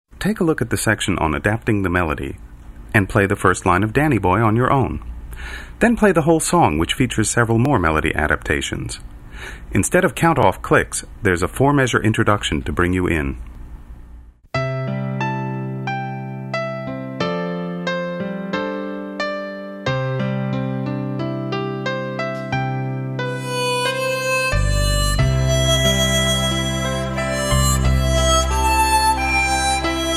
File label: danny boy, harmonica